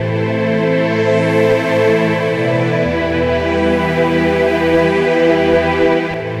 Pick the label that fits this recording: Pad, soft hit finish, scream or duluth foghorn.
Pad